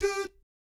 DOOT G4, Vocal Jazz Fx; Spectrasonics; Vocal Planet Vol.3 Jazz Fx

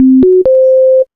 BLEEPS

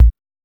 HSE-BD

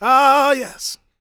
GOSP MALE, Vocal Planet Gospel

